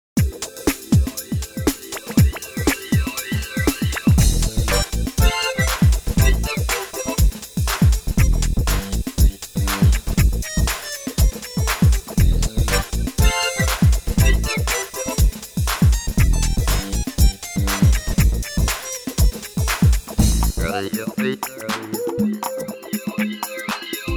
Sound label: rap jazz